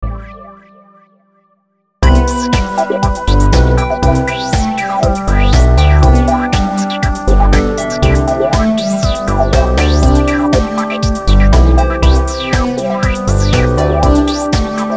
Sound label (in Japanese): sasayaki karaoke; teto